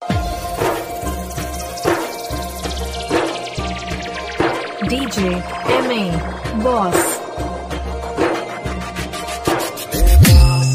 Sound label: New Mood Off Ringtone